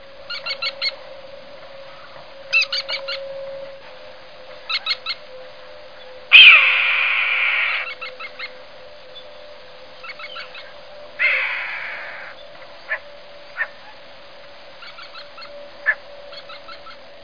pustelga amerikanskaya falco sparverius

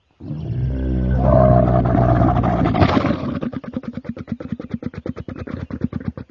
odnogorbij verblyud ili dromedar camelus dromedarius; Средь песчаных «гор» пустыни «плывет» величественный корабль

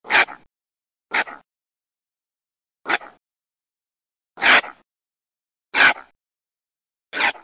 enot poloskun procyon lotor

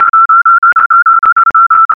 arizonskij ushan idionycteris phyllotis

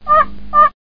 dalnevostochnaya zherlyanka bombina orientalis

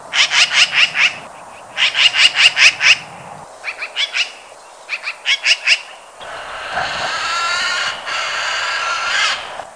soroka pica pica

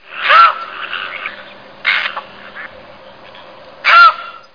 bolshaya belaya caplya casmerodius albus

